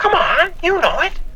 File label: VOX GENERAL; AMG Megabass REMIX! VOL-2